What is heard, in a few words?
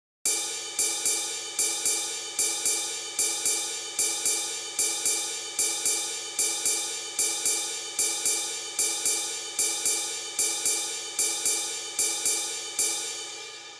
Shuffle